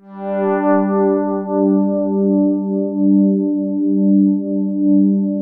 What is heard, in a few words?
5th soft G2; SPACIOUS; Music Store - Claudius Diemeter Schwerlos; SPACIOUS 1